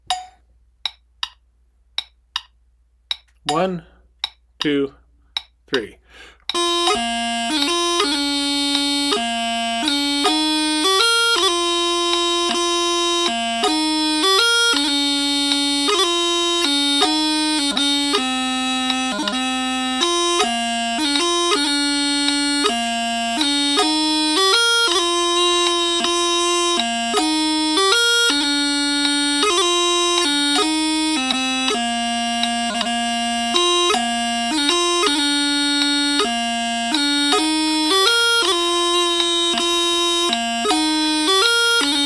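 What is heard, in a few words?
53 bpm, Chanter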